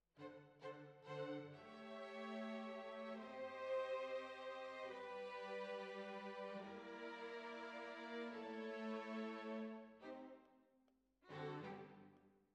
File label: Flute